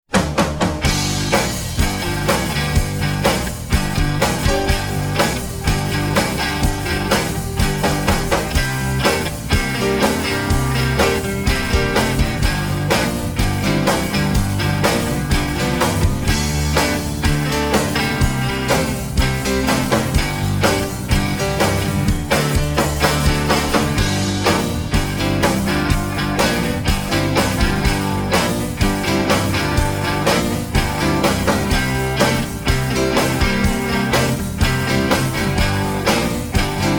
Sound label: Rockin Blues in G Slow